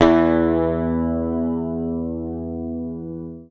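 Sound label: bass, E2, left channel; PICK; Sampleheads; Will Lee Bass Library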